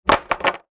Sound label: impact wood plank large drop hard ground; zapsplat